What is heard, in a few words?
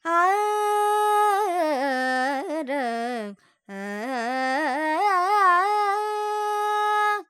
Major, Carnatic Female, Alaps, Sankarabharanam, Dry; Vocals; Sample Banks